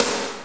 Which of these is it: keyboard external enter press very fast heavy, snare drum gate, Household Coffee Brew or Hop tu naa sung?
snare drum gate